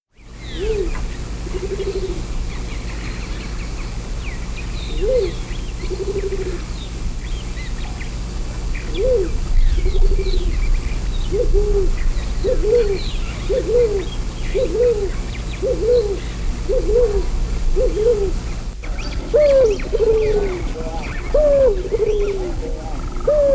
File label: Streptopelia decipiens -- Mourning Collared-Dove